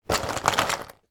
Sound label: office stationery put inside small pencil case